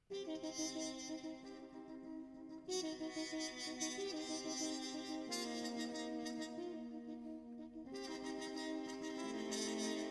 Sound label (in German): Fanfanfaren fuer vier Trompeten; zefiro torna